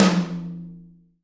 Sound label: big room tom one shot f key; tom drum samples; one shots